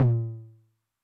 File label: High Tune Long Decay, 909 LOW TOM; classic machines; LT - LOW TOM